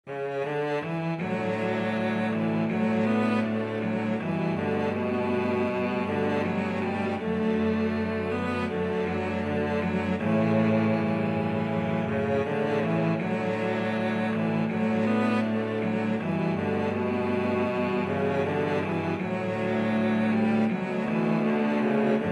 cello quartet

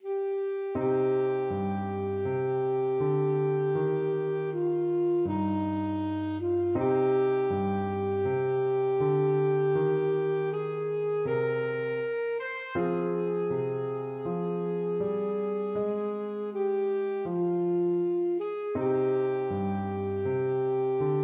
ASAX, saxophone

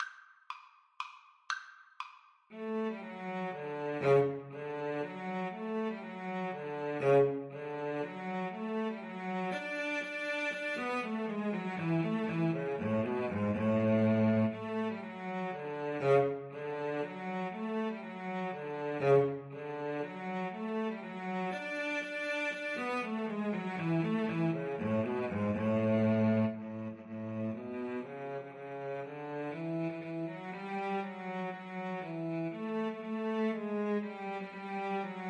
Cello Duet

